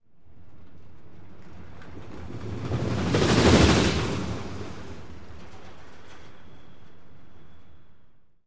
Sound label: Roller Coaster Quick